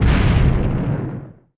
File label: boom; BESTSHOT; noisybit